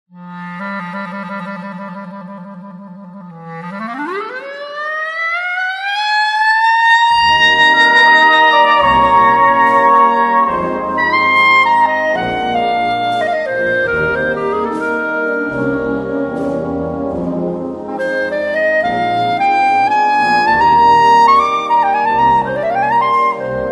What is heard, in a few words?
Blues, clarinet